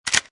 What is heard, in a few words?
Machine Gun Foley Insert Magazine; Machine gun, type 2; Gun Handling; Lynne Music